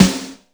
hank, snares real

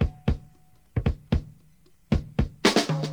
Drum loops, Rolls

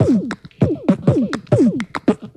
Beatbox, Drum loops

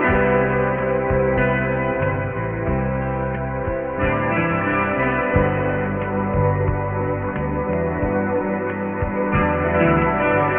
keys, Phonky loops

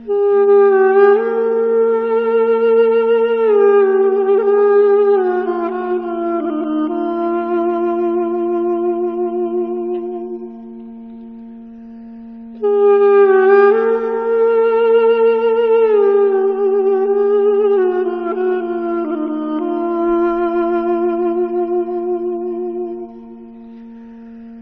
flute desert sands, Loops, Sad

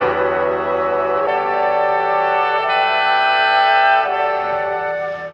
horns, Loops

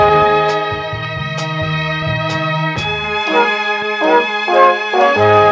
strings and horns, Loops; Phonky

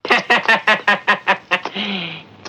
laugh maniacal; ooh ahh

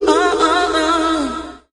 "oh oh oh" SUNG; Voice

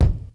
kalm, Kicks Medium

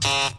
elevator, zaps and beeps

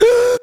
breath

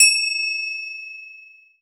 triangle magic; Misc Percussion

